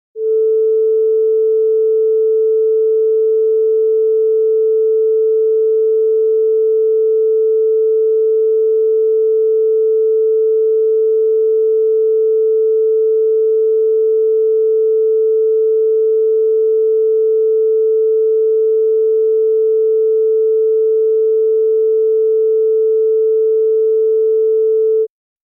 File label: tuning note